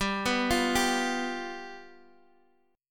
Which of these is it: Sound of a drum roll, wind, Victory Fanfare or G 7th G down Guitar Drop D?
G 7th G down Guitar Drop D